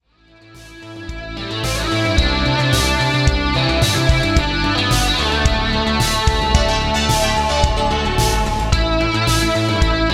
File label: 80s Sample